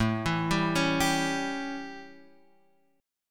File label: A 7th Guitar Drop D, chord